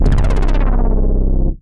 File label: OSC Overdrive, Bass; Instruments; Wavetable; Ableton; Synth Essentials